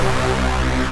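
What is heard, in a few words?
Rave Synth, Dance Mega Jungle Rave; Best Service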